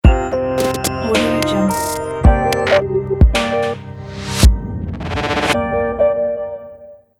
modern digital glitch logo

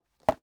box grab department store type

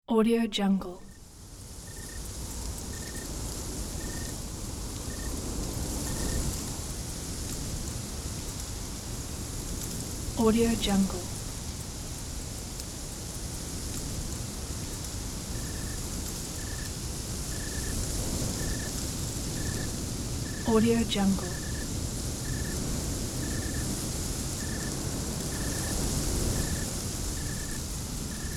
night wind in forest

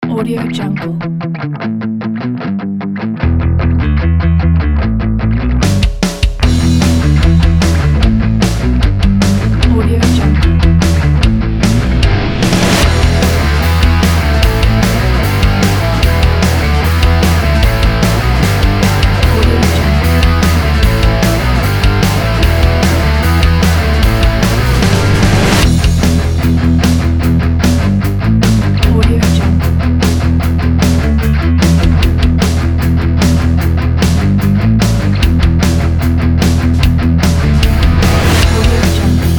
punk

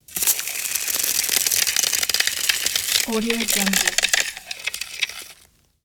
dry ice sizzles

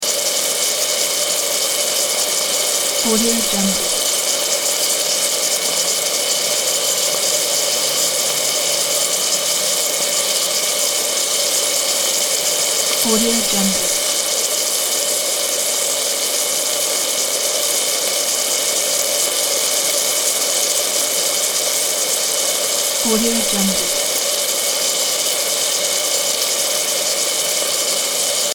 cooking loop

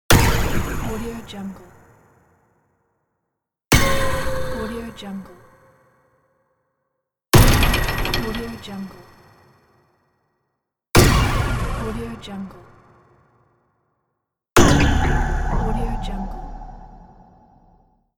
spaceship cannon impacts

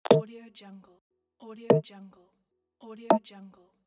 interface clicks

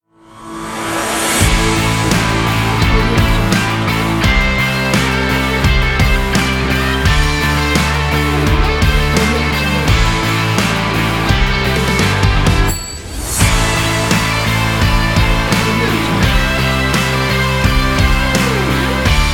motivational epic sports intro ident

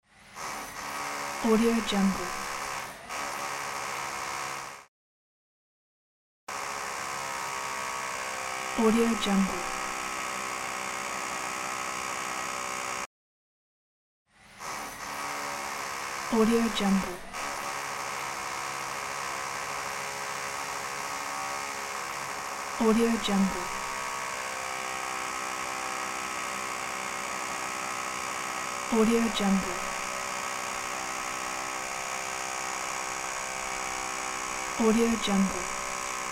drilling behind the wall; ambiencerecords

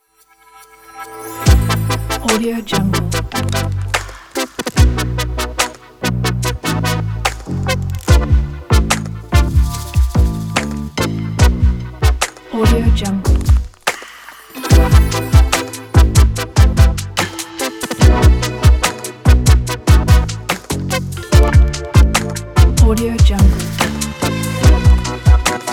modern sentimental noir

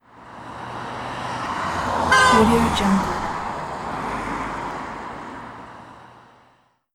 car horn pass

